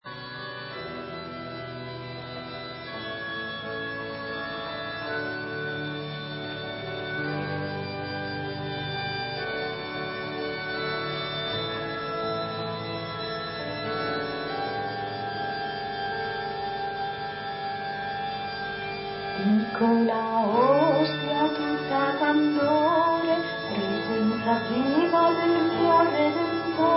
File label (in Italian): canto eucaristico